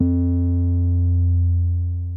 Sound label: Drum BPM110; Martian Chess